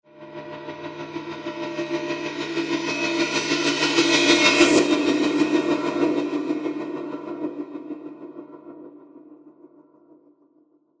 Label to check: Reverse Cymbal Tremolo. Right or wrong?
Right